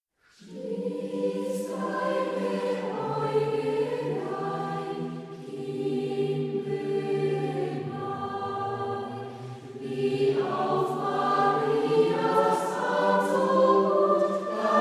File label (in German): Wiegenlied; Ein Kind ist uns geboren